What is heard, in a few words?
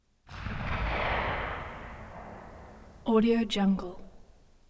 gun tails; soundmorph